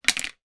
toothbrush place down; household

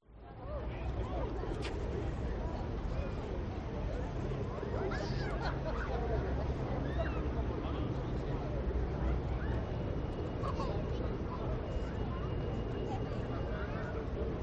England City; audio hero

